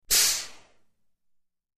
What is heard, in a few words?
Small Air Brake